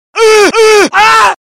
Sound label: Ugh Ugh Aaahh